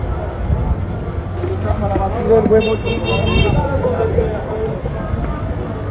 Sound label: Moto